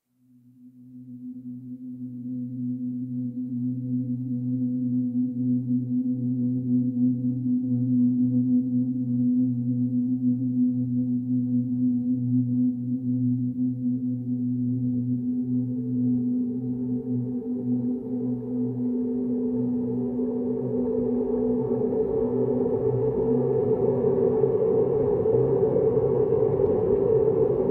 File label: Calm Wave; ZenAura